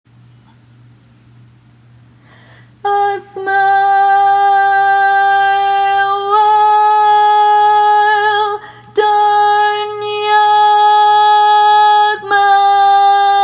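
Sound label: Baritone, Barbershop Tags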